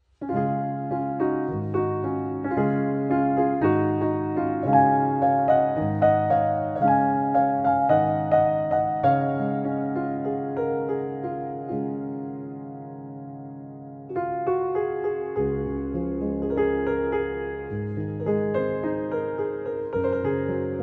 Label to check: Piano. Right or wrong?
Right